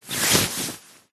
clothing shirt movement rustle arm into sleeve

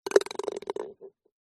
Golf Ball In Hole

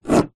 foley wood scratch with metal object; zapsplat

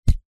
metal pole grab with hand; foley

